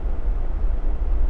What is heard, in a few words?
ambi tunel; Mafie 1